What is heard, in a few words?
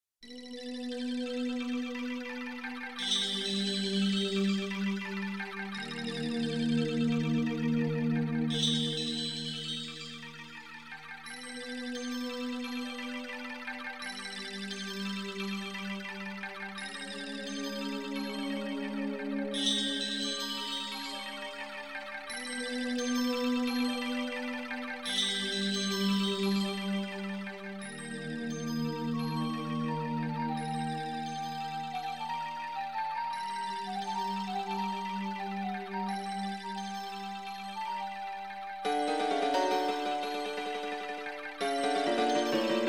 underwater; PhoenixCraft-1.16.5; ambience music